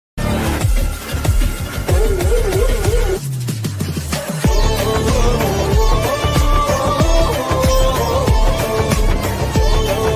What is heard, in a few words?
tamil songs ringtone bgm; beast; ghilli